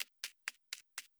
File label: Moss Walker Footstep Loop; gamerip